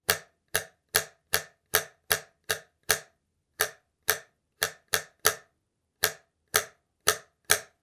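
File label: ruler sound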